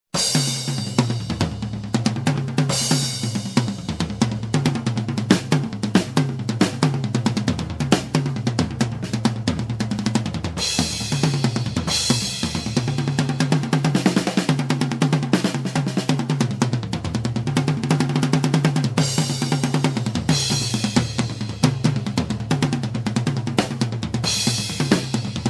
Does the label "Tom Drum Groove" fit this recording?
Yes